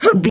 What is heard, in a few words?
voice female effort jump action